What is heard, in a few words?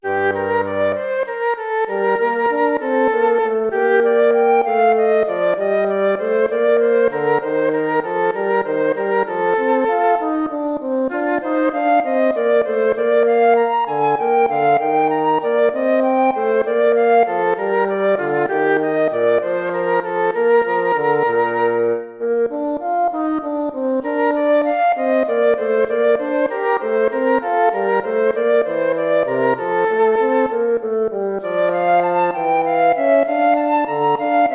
gigue